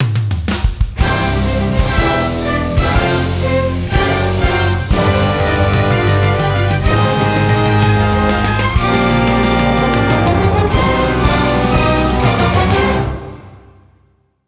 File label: Major Win Full Fanfare